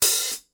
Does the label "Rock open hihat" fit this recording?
Yes